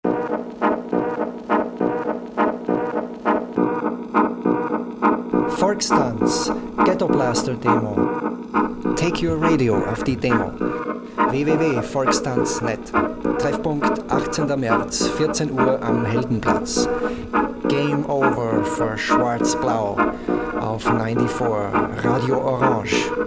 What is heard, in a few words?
jingle